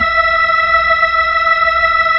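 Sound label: B-3, Hammond B-3 organ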